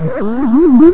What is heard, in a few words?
pain; svencoop; cryocell